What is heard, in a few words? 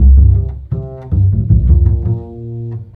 bass, Loops